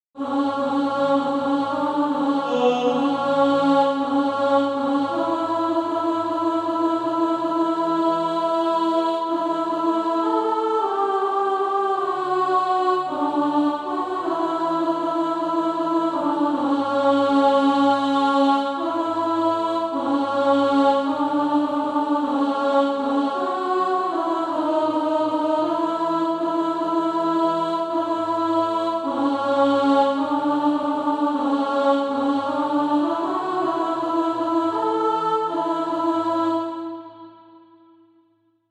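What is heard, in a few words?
alto, Sheet music (SATB) including Practise Music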